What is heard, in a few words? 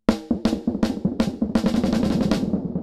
Delay TIME MODULATION LEVEL 50.0 WAVEFORM tri